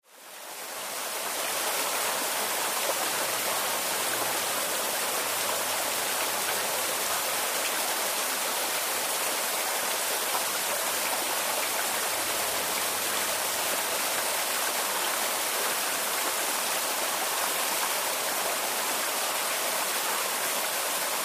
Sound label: Waterfall